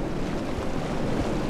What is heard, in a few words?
lake waves